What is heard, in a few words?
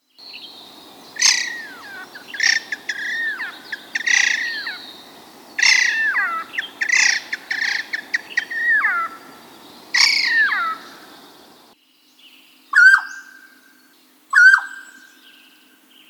Mountain Quail